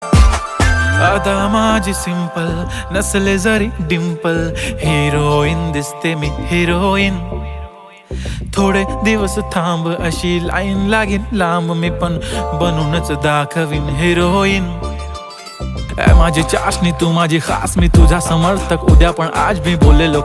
MARATHI SONG FOR TRADITIONAL LOOK MARATHI SONG LOVE